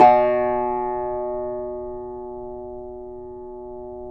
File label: tamb; Roland - LA Composer vol. 3